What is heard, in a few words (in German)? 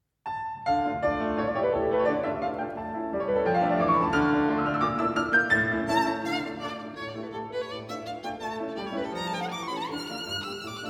doppelkonzert d moll allegro molto; mendelssohn bartholdy